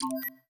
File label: UI Submit